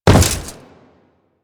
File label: Step Default, Footsteps; Warframe; Grineer